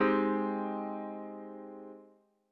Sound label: Ab maj7; ackordtabell